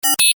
Tech beeps, Beeps, High Tech; Tech Sound Effects; Tech (Sound Effects:Science Fiction); Stuart Duffield

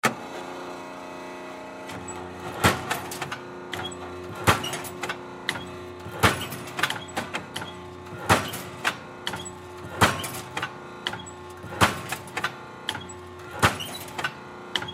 Metal Shear On Run Off Pound Cut; Materials; Industry; Blastwave

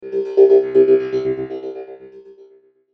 Sweeper Short Digital Wah; Production Element Imaging Element Accent Transition; Sweeper Sound Effects

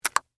Button Snap Close, Leather, Case; Foley; Camcorder

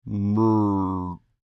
Creature Speak Groan Short, Human; Groaning; Big Room Sound

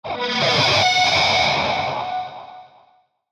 Feedback Metallic Swell Scrape; Feedback Sound Effects

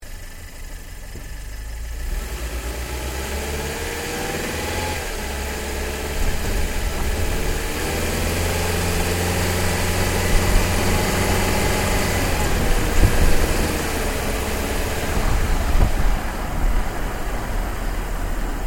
Drive Sequence Engine Bay, car; Hyundai Accent 2008; Hyundai hire car; Vehicles

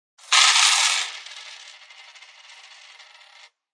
Dumping coins onto table; Clinks

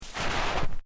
Footsteps Boots Snow Deep Step; Footstep Foley; Footsteps (Sound Effects:Human)

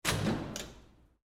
Door Open Gym, Foley, Door, Open, Gym, Annex

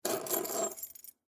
Key Chain Pick Up; Foley; Jangling Sound Effects; Jangling (Sound Effects:Objects)

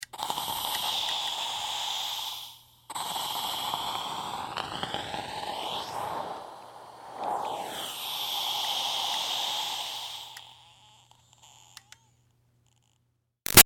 Steam Release Iron Breath Moves; Miscellaneous Household; BigRoomSound

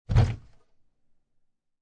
Cabinet Door Open Wooden

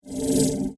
Scanner Beam By, Scifi

